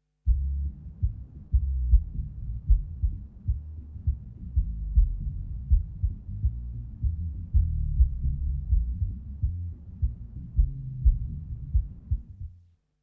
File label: Bass burble